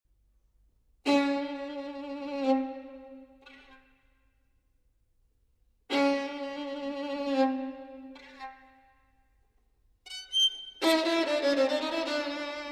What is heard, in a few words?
Solo Violin; Chants du Sud, Six Short Pieces; V. 5ème Chant